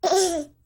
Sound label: baby laugh; taizou